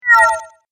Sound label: Game Vanish Sound, video game